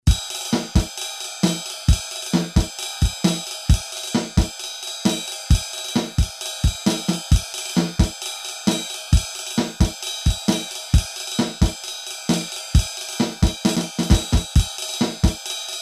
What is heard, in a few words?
Pops, Casio CSM-1, Rhythm Patterns